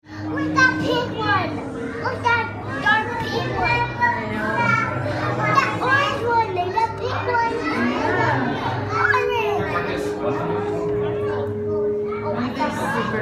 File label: Jellyfish Tank: Children Vs. Adult